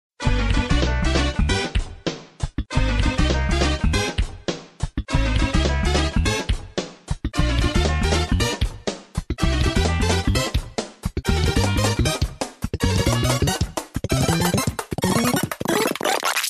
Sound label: course clear; reversevape